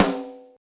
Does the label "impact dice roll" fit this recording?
No